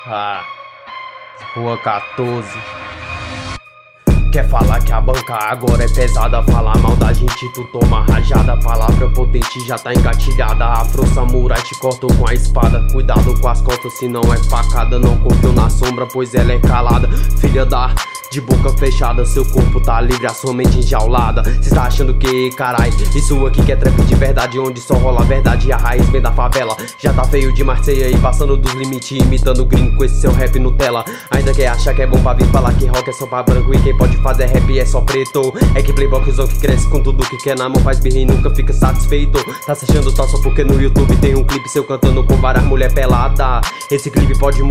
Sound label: trap